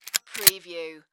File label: Shotgun Rack; sound effects bible